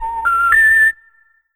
Ensoniq ZR-76 Wrong Number

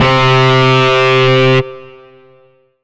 Yamaha EX5 Grunger C3

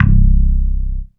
E-Mu Proteus FX Thunder C1

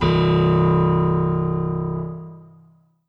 Korg M3R Grand Piano C1

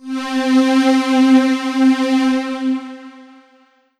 Yamaha TG77 Cosmo Pad C4

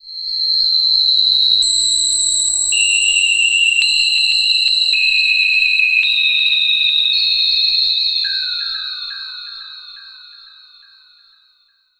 Korg 01W 50s SciFi

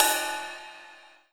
Ensoniq VFX-SD Ride Cymbal

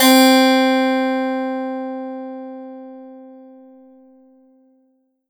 Roland SC-88 Harpsichord C4

